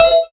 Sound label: Combo; SFX SAO KEY Pride Combo 4